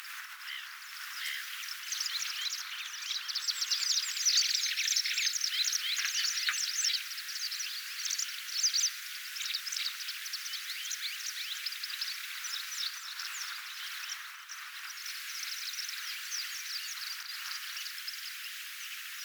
vastarakit ajavat kanahaukan pois

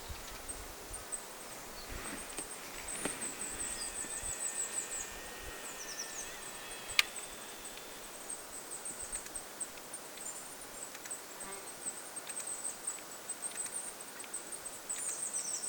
pikkulintuparvi jossa on hippiaisia

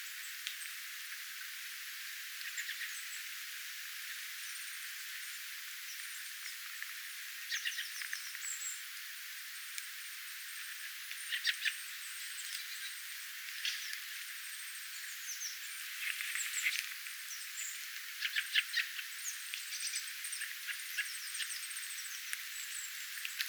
ilmeisesti hippiaisen hyvin korkeata sarjaaanta

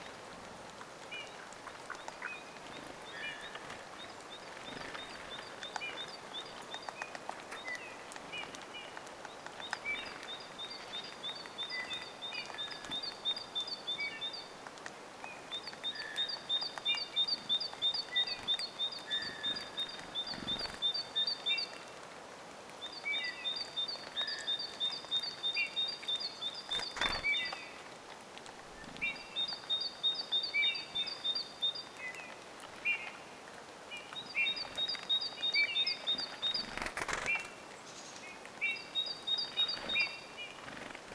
tulii-punatulkkuja tulii-ääntelevia; Vaikka oli pakkasta ja oli yöllä ollut aika kovakin pakkanen

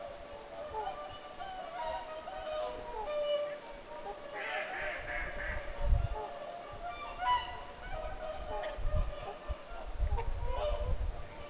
vahan kuin haukahdukset ovat nuoren linnun aania; nuo kaksi kuin haukahdusta ovat tietääkseni nuorten laulujoutsenten ääniä

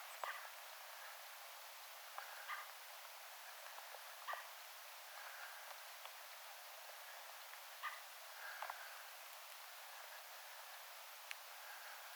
rakattirastaan huomioaantelya kaikuu hieman pikkutalojen valissa saaressa kylassa; Hiukan kaikuu pikkutalojen välissä ääni.

